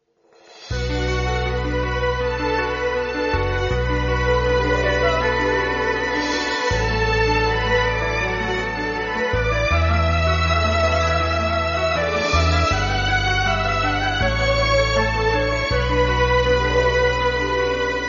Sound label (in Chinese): 纯音乐